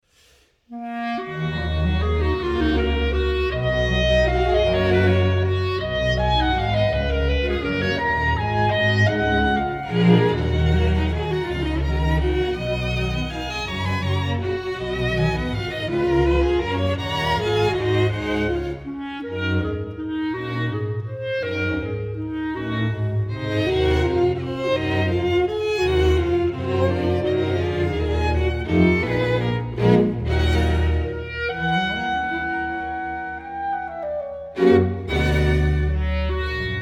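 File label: Brahms Clarinet Sonata 2nd movement; Festival Montalcino 2009; E-flat major op. 120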